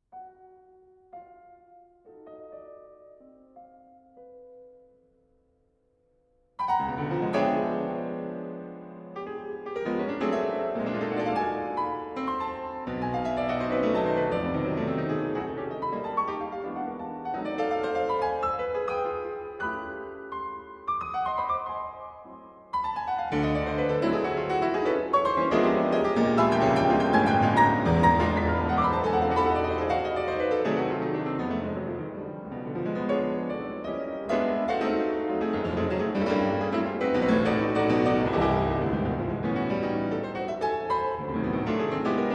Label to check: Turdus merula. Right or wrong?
Wrong